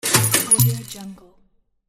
buy item

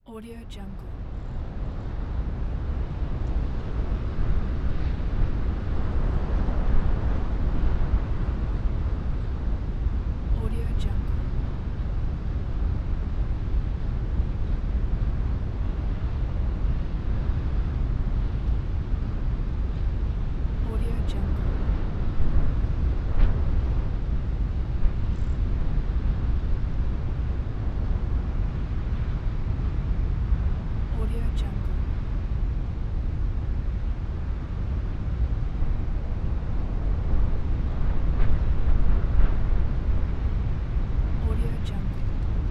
a desert

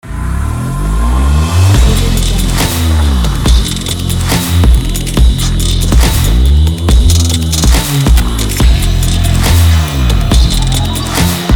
intro glitch beat ident

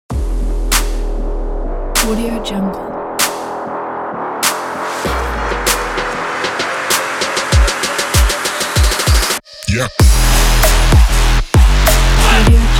trap it on

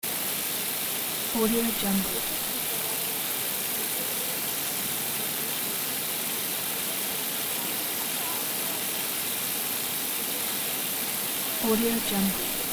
small park fountain people chatting

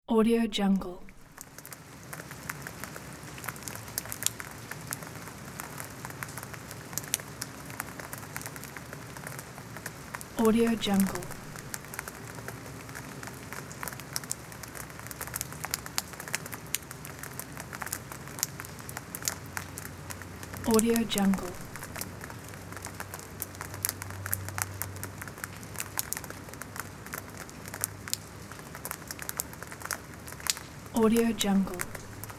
street rain drips

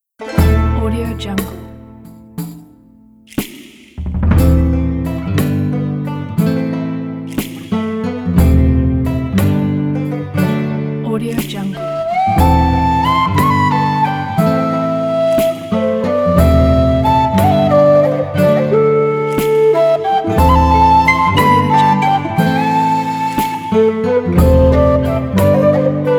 ancient tabern medieval

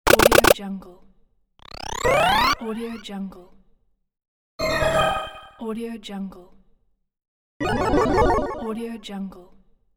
games fx

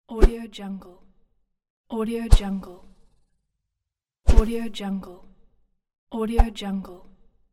swish

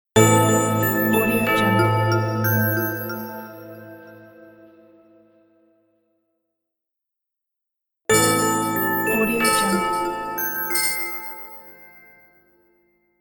christmas logo

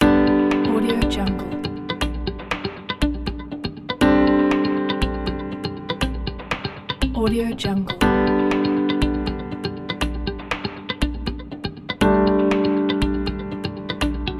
inspiring time

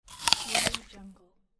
bite the apple

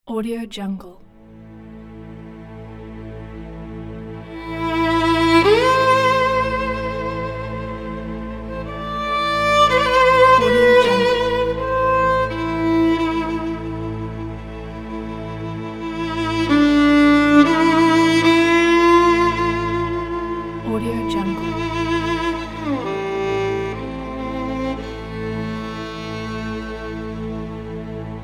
violin of memory

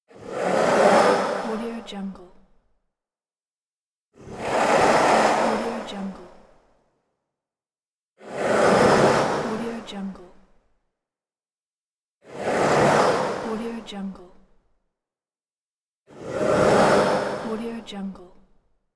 eerie flyby whooshes

